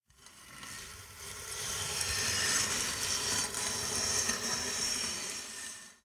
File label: freeze slow; Apotheorasis